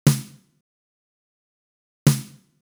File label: snare memphis; hydrogen